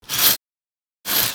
shower Take Towel; Singles; French Version

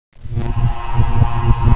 Sweeper pass; Prometheus 1.0

